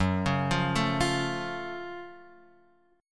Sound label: Gb Minor Major 7th Gb down; arpeggiated; JGuitar